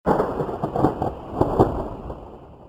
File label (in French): tonerre; Tonnerre (1); Bruitages